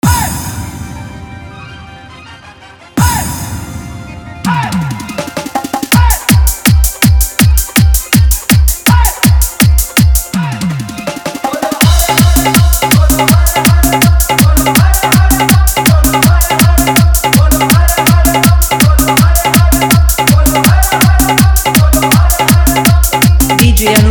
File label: Dhollki Bass Rod Dance Bolbam Mix